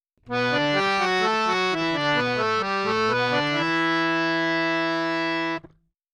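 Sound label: Dry, 3-4, G major, 128 bpm, melody, violin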